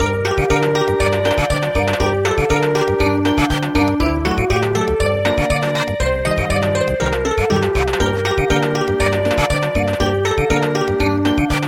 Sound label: Samba; Canaries